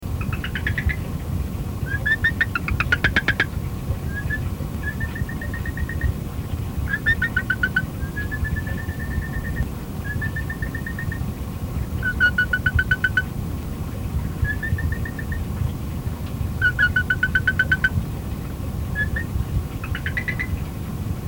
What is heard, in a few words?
Coqui Guajon; CBD